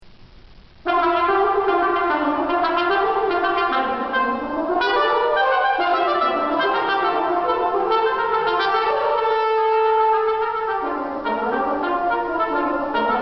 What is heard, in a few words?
Scherzo